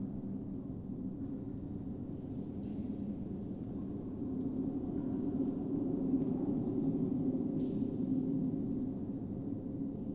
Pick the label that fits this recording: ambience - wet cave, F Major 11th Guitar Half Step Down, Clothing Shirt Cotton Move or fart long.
ambience - wet cave